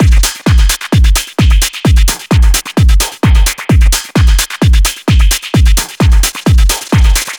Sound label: Drum Loop 130, Techno